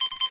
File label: bomb tickx